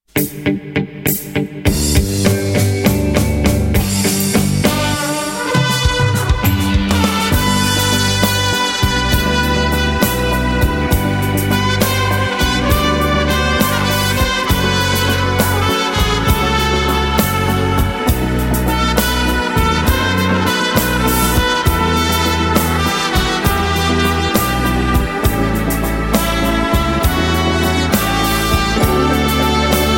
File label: trumpet